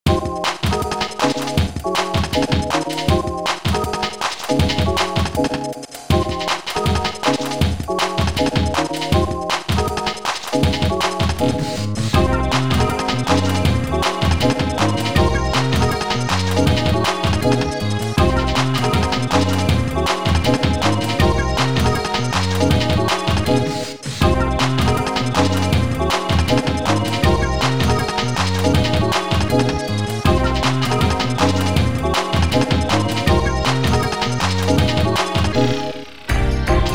drumbass mix; Street Fighter III 2nd Impact; Siberian Railroad; NECRO STAGE; SNES

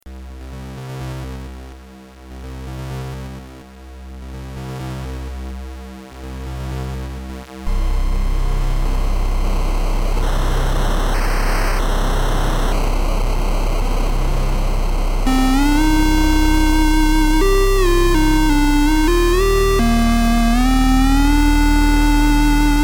2A03 VRC6; Akira

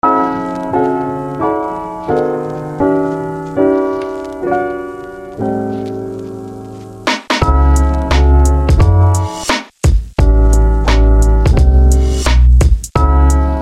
Lofi Piano

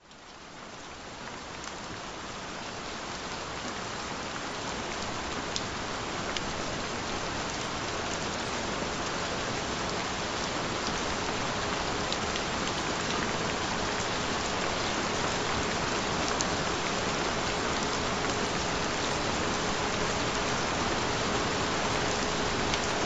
Gentle Thunder Sounds; Eczema Healing; Powerful Eczema Relief; Beautiful Skin

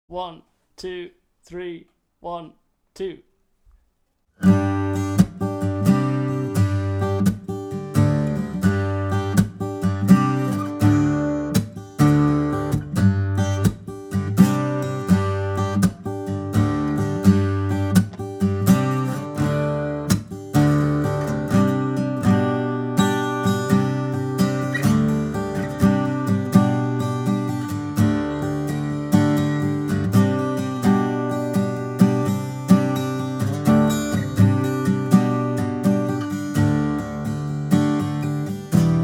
Danish slipjig, guitar steady

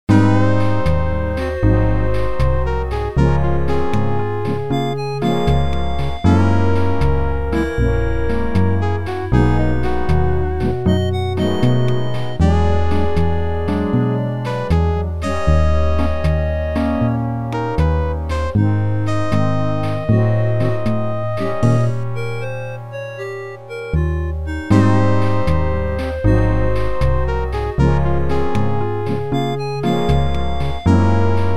Sega Genesis Remix; SongGalaxy

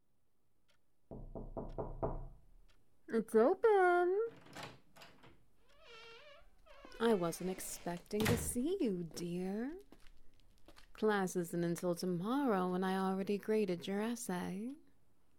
Ambience Wet Noises

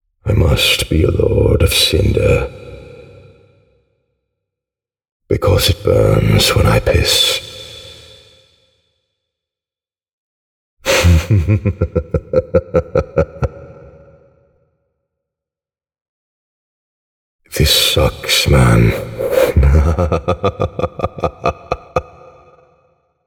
sfx; lord of cinder

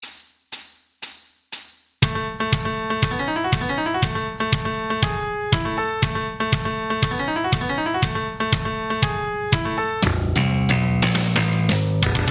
Backing Track; Legato Lunacy

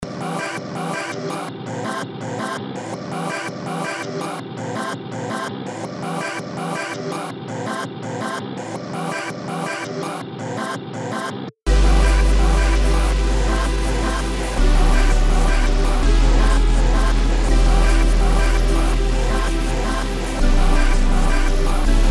165bpm

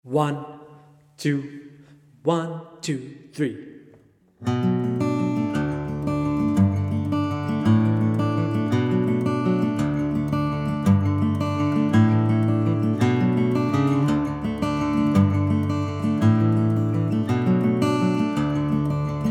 Guitar Full Speed; Da Lounge Bar

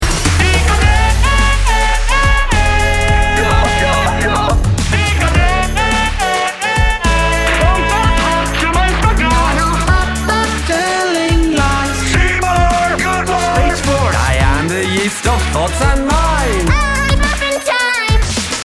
ringtone android; Schmowind 3

